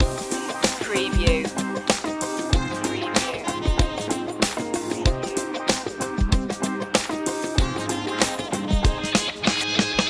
forever funk loop

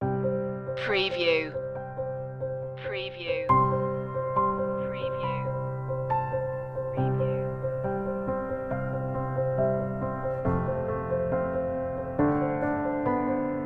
solo piano

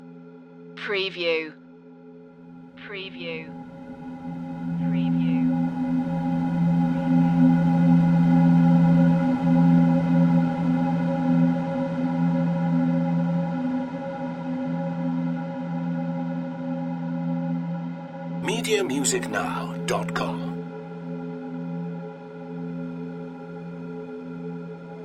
space ambience

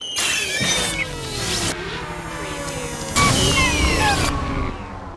Stinger; Sound Effects Bible

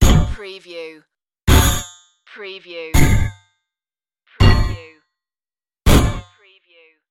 spaceship docking hits

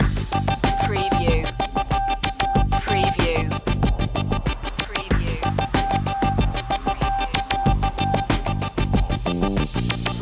incoming transmission loop

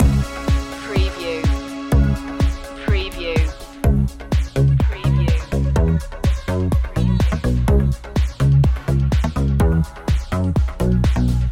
dancefloor loop

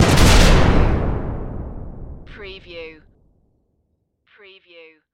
Explosion Heavy; Sound Effects Bible

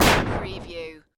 Retro Scifi Hit

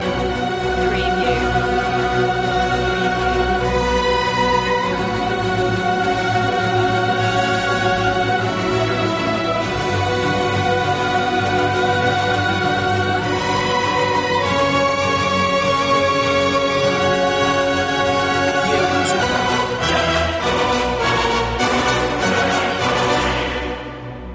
no perc

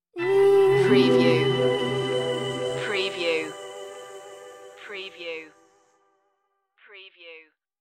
slow rock stinger

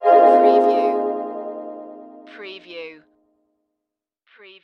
Magical Whoosh

